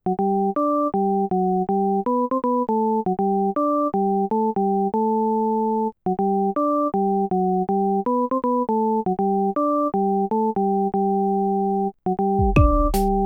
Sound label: Menu Music; BatterUp